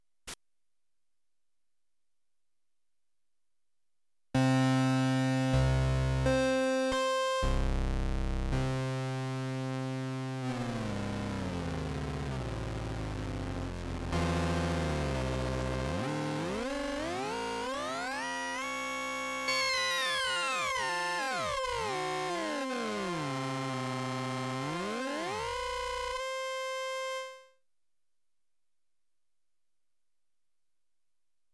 brass mode, Kawai sx240

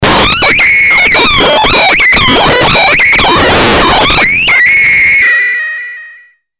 synth distortion; Polivoks formanta

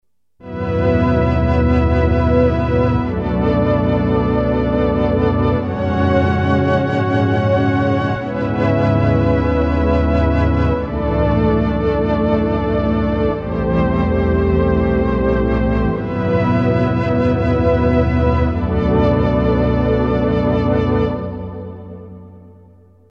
korg m1r synth; pad: with modulation; cpu NEC-V50 (part of 70216 family) manages 16 voices polyphony

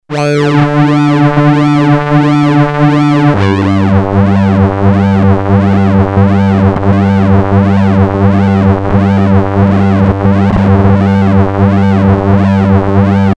voice, Novation Bass Station 2; analog monophonic machine with subtractive synthesis

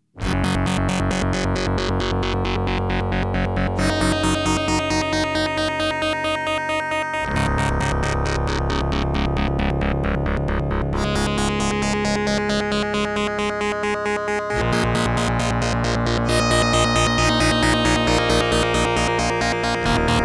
synth lfo dx21, yamaha dx21

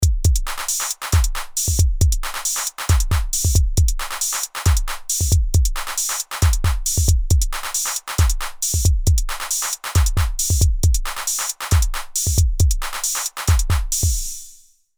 drum electro, roland jv2080

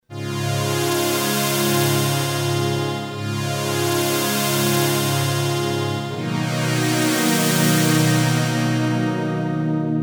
pad brassy, roland jd800

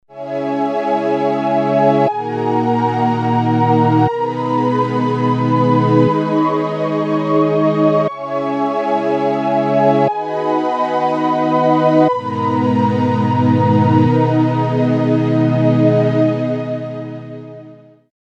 vs strings; sci prophet vs

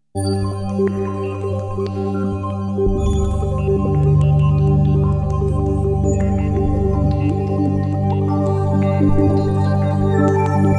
pad, roland jv2080

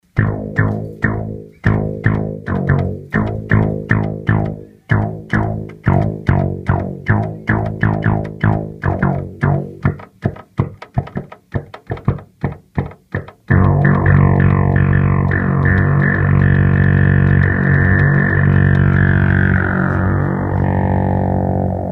acid, crumar CPB2

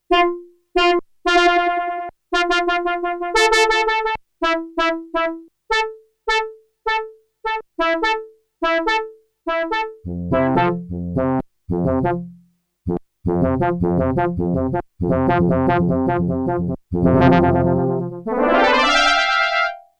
delay, yamaha tx81z

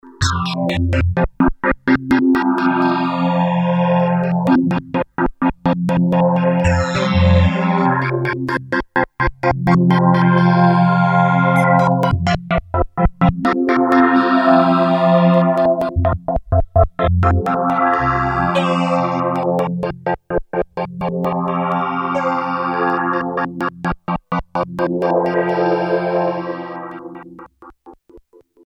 synth; Electrix Filter Factory

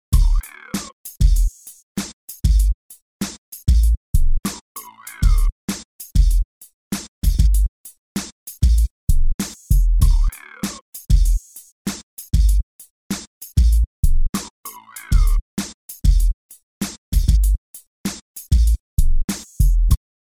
Kit; emu mo phatt

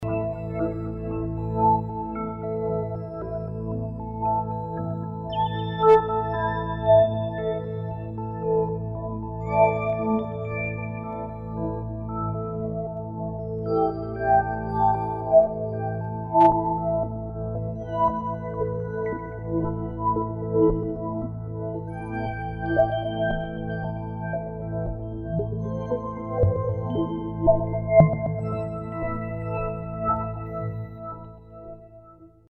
pad resonance, Pad: resonance, Roland JV2080

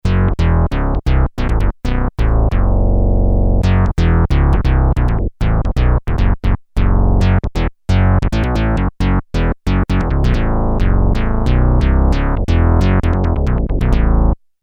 bass attack, synth Roland alpha juno

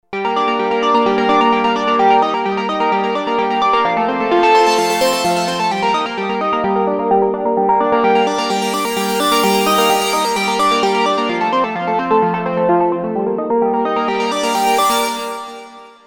synth arpeggio; Creamware minimax

